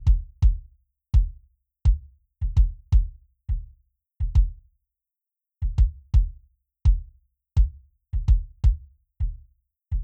Drums Kick

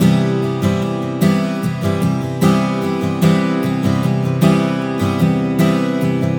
Spacious Guitar, loops

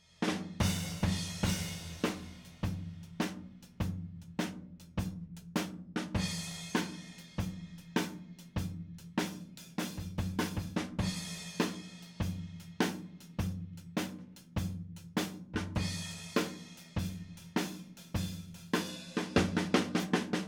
Tom

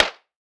Clap, Interesting Drums

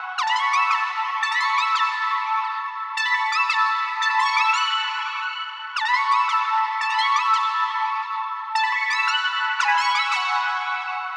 A Synth Riff, Synthwave; Flamingo; Synthwave Loops; Futurism

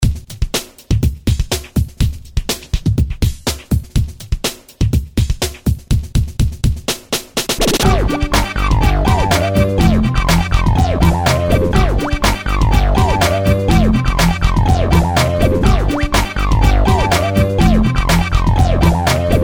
Beatdown, Music background pads

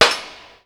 BANG, explosions gunshots

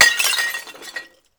glass, breaking

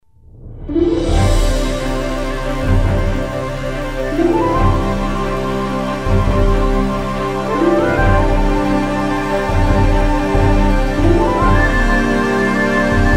Music background pads

